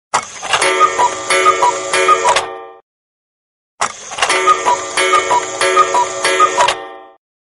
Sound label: cuckoo clock